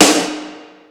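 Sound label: Snare, Yamaha SY22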